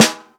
short steel snare drum A key; snare-drum-samples

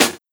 smooth snare sound f key; Duration 0:01; Samplerate 44100 Hz; Channels Mono

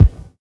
good bass drum single shot g key

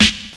90s good hip hop snare drum g sharp key

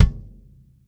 loud kick drum one shot g sharp key

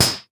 muted high hat e key

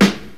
good acoustic snare sound g key; snare drum samples

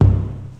low kickdrum sample c key

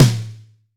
low tone steel snare drum A key

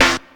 prominent snare drum f key; snare drum samples

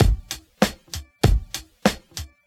97 bpm 90s drum loop sample g key

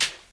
original foot hi hat sound f key

